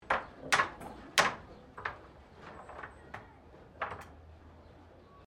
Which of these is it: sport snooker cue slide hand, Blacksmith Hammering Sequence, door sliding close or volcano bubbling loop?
Blacksmith Hammering Sequence